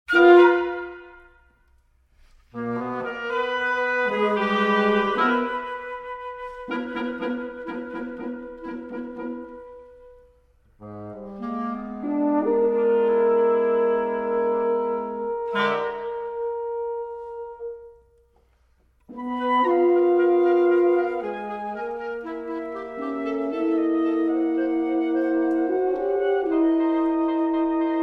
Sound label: Wind Set For Woodwind Quintet - Mvt. 1. Quarter=63; Walker (George)